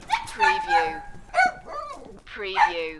Small Dog Yelp

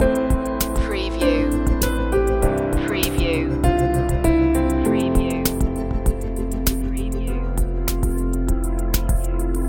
changing light loop

